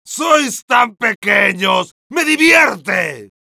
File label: yell, es; Heavy